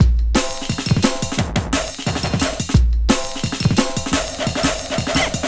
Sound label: Rugged Break, Drum & Bass, Beats & Breaks; Fluid Dynamics